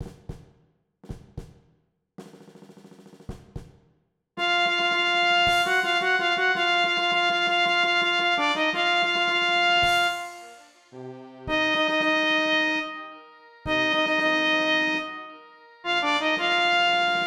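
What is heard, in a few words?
trumpet 1, Bb 1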